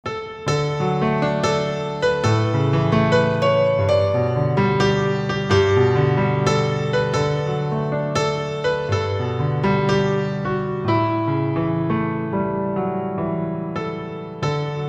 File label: piano